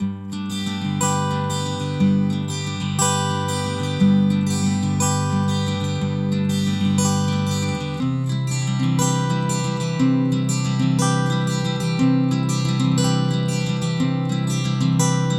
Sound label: piezo guitar DI with IR after